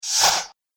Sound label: Ghost Whoosh; Ghost Sound Effects; horror